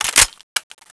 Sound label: g3sg1 clipin, weapons; cstrike